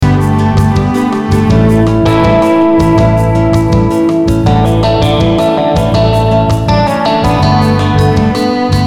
guitar clear chorus, Clear guitar chorus dans l’arrangement; Rickenbacker; guitar clear chorus/space